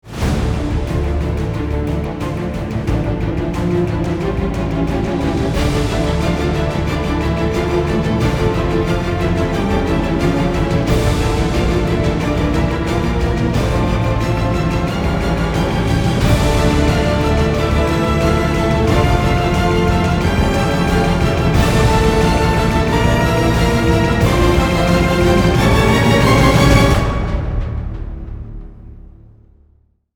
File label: No Choir